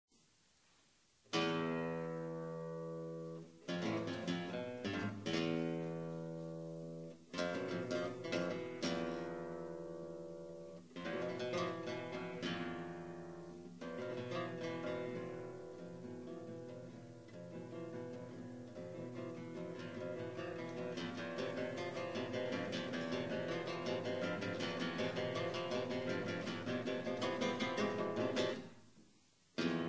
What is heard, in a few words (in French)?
suite pour guitares